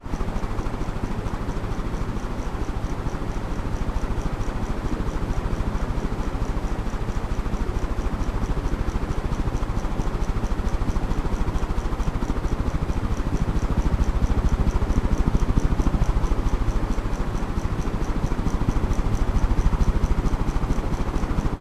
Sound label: household ceiling fan operating fast; zapsplat